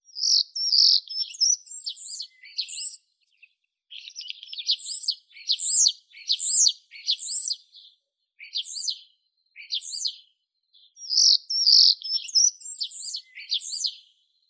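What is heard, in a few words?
common starling sturnus vulgaris; skvorets obiknovennii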